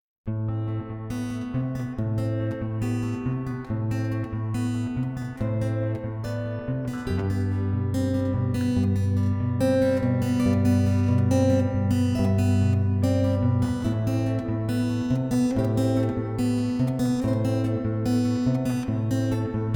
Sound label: guitar